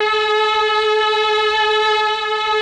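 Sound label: Mellotron strings A3; Greytsounds VOL-1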